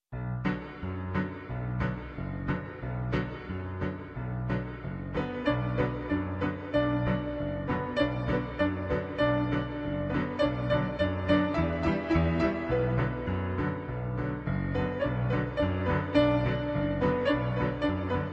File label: piano cover